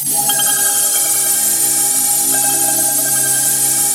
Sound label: TELEPORT; Pro-Rec - Synthsonic